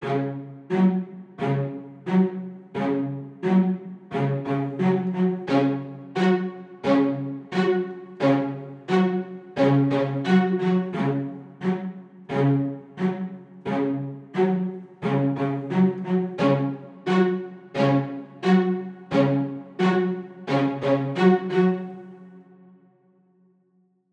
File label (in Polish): klasyk strings